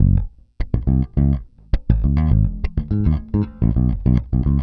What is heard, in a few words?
Pop, Bass